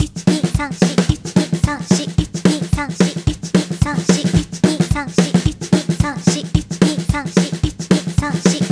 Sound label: backbeat country